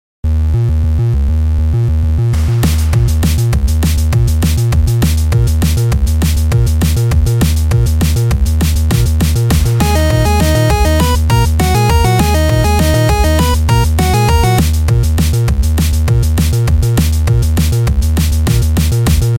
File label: Chiptune